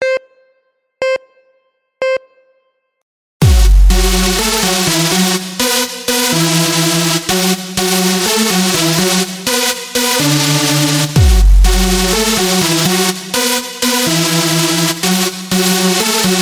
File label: Distorted Blast